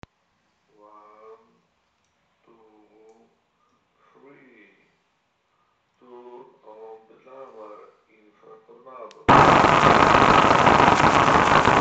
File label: Audio jammer INFRATORNADO vs HTC ONE M8